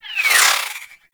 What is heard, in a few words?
Warfan Projectiles Whizzby; Broken Frame